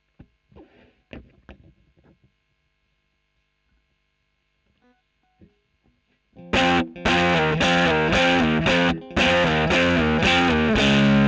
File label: E.GTR Royer